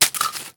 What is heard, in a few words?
Shell 12ga leaves; STALKER; IWP